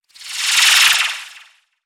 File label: Hip Sways; Evolve; Wraith